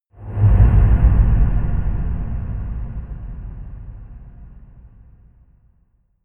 whoosh noise reverb whoosh

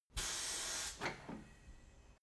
tire changing machine hydraulic separator short

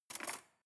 cutlery cake fork drawer grab

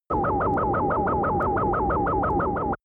idling fighting racer

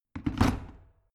plastic water boiler place

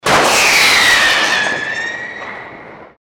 rocket sweep add

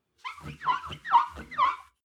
clean window paper towel close short

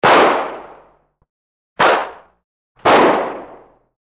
handgun multiple shots

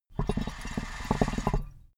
water pipe puff bubble short

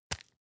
tissue tap

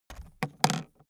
aluminium case close

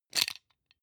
plastic frame small hit rattle

